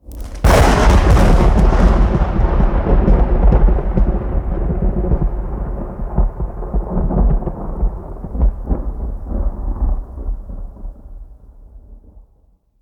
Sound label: Lightning End